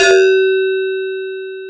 Digi schop, FM; Polyend

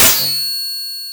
Metalen perc, FM; Polyend

